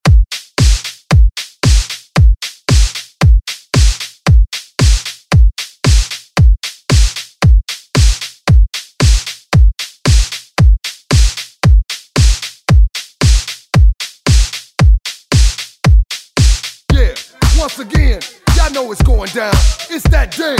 Remix 114bpm

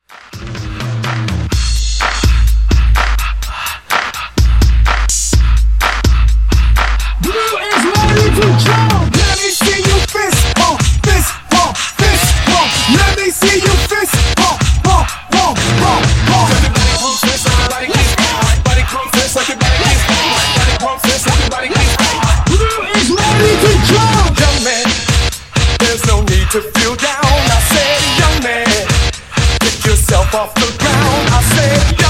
Mashup 126

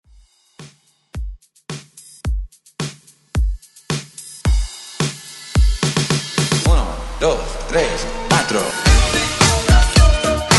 ReDrum 108.9

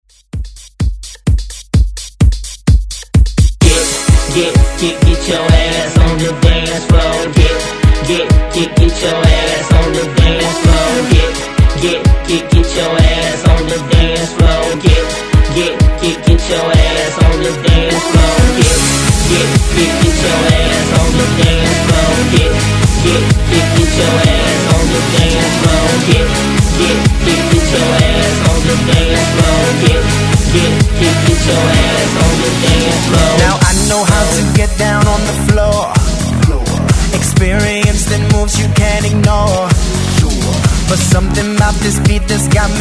Hype Re Drum 128Bpm

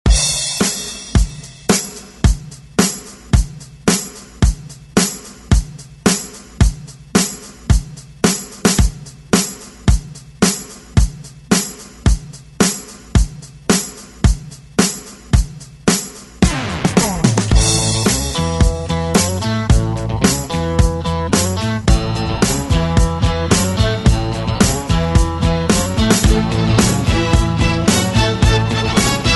70s; ReDrum

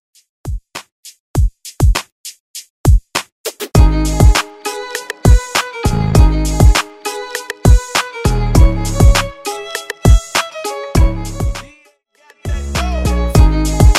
RNB Redrum

